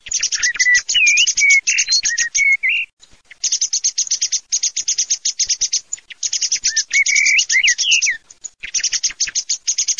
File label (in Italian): MERLO, birdsound